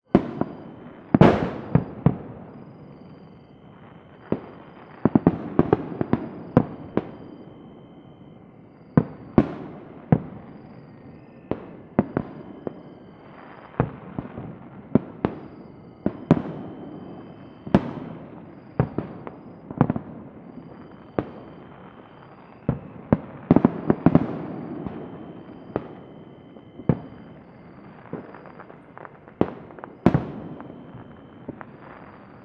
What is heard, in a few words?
fireworks distant new years eve mexico; felix blume